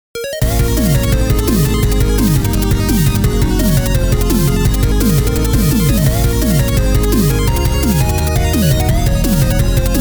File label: 8-bit Tune; Will Kussmaul